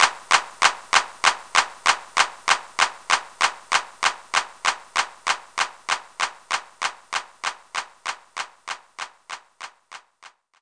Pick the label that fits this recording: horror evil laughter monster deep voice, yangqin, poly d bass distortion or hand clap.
hand clap